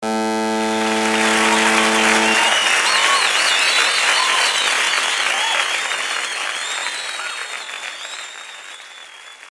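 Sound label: environment applause theatre; Wario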